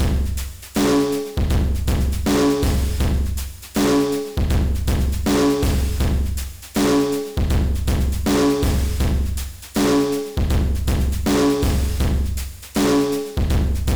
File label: Effect Loop, BPM 80